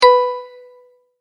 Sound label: multimedia tone correct; Tones; Sound Design; McKinney Sound